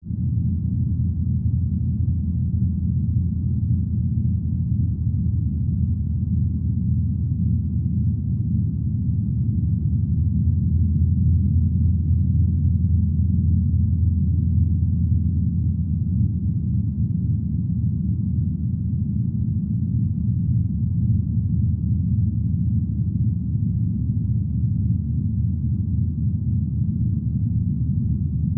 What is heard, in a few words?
Ambiance Mid Bass; drones & tones